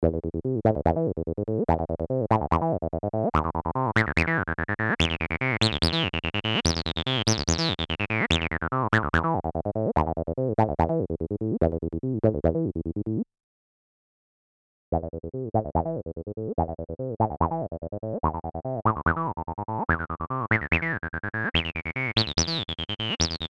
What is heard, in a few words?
TB-303 VS Bassline V2 RAW; Audiorealism